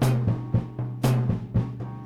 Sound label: drums